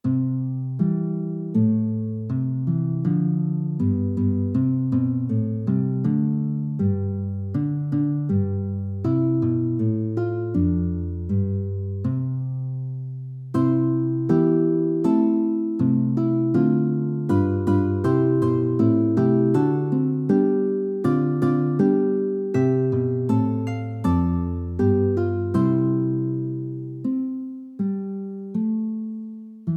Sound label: tutti; SATB gennemkomponeret korsats af GK 2008/2023 over tekst fra Galaterbrevet 3,27-28; Motetter til gudstjenestebrug